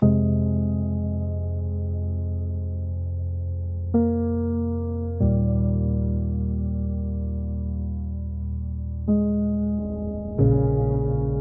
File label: Ocean Heartbeat, Nature